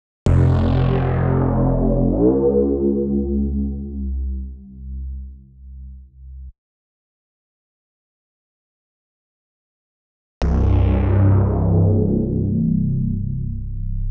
Voicing and Filter

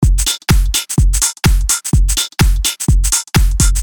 Beat Full

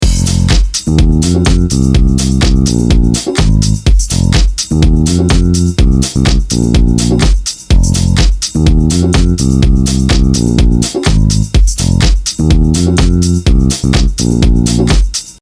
Disco House Bass No Leading Notes Example

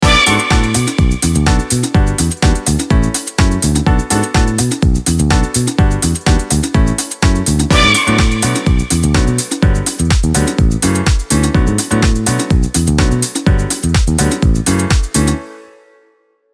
16 Bars Disco Example

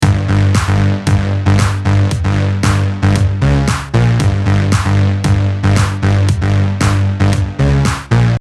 Viol Bassline With Kick Snare

